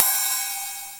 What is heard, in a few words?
cymbal; E-MU Formula 4000 Series Vol. 1; Hip Hop Nation; Bonus Perk